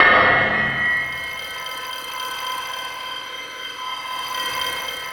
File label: CAVES C5; Q Up Arts; Dream Experience